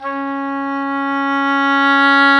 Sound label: oboe, Roland; Oboe Dry; Roland L-CDX-03 Disk 1; Oboe 4-8